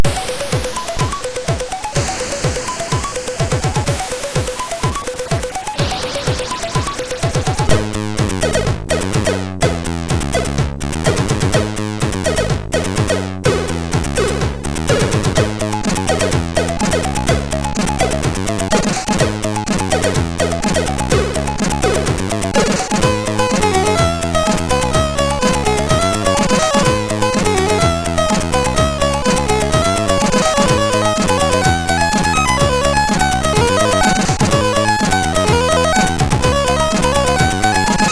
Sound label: Intro, MOS6581R3; hvsc